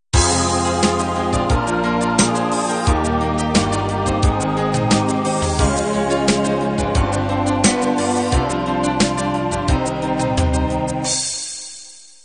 cinema ballad intro

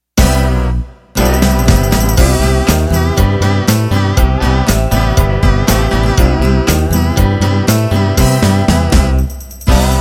Modern Country End

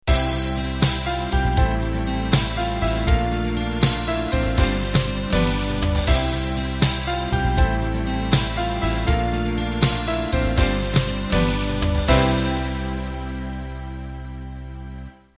12-8 Ballad End

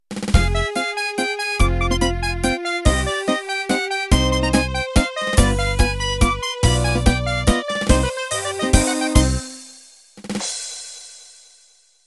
Waltz Italiano Intro

